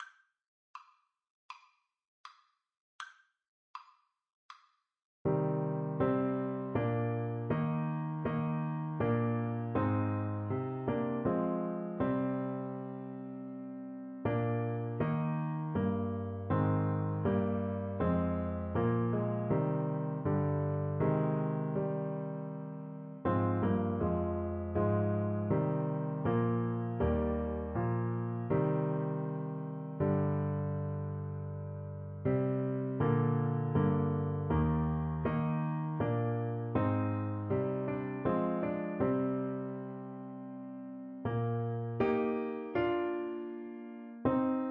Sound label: Saxophone